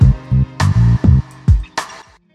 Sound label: Jazz Bass Loop